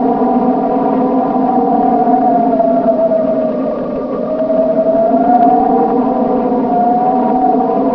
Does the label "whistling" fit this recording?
No